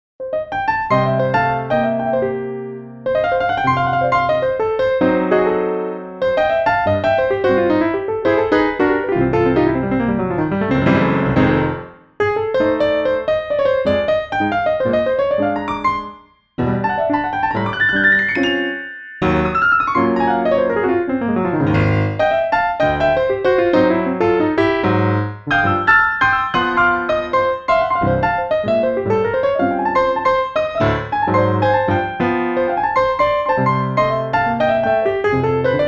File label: blues; pianoteq8; yc5